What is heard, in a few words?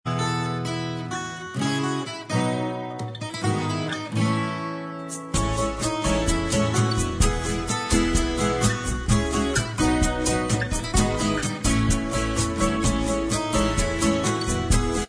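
bossa, instrumental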